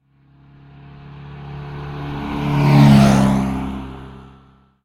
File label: car; Blind Drive